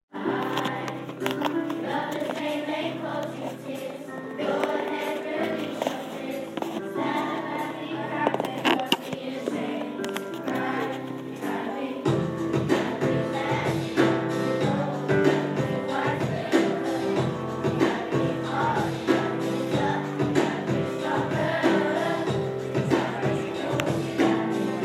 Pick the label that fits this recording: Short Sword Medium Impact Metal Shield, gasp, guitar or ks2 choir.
ks2 choir